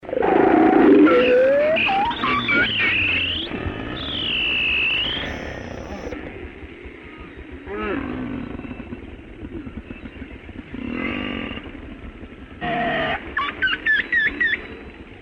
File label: beluga; WWF Rangerclub